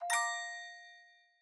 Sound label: confirmation